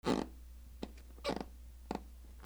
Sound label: SQUEAKS